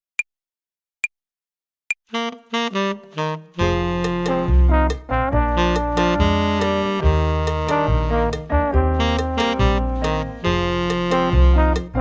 Play-along, Schlagzeug; Jazz Club Schlagzeug

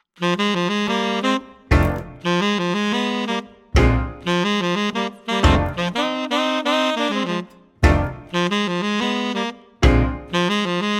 Tenorsaxophon; Jazz Club Tenorsaxophon

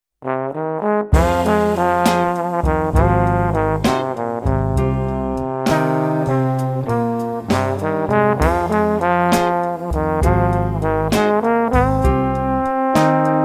Jazz Club Posaune

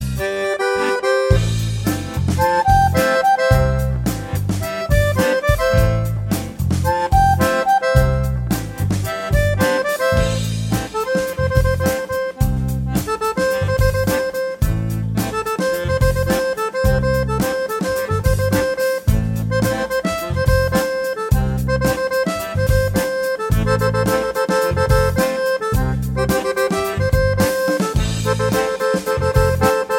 Whistle, Akkordeon

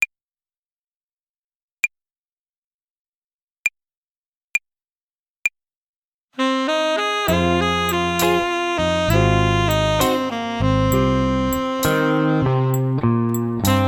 Play-along, Schlagzeug; Jazz Club Schlagzeug

